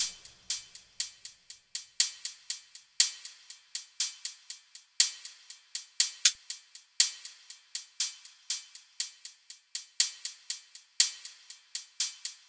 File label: Drum Loop 120 BPM Hihat; Loop Stems; Cymatics; Vibes II Drum Loop Collection; Operation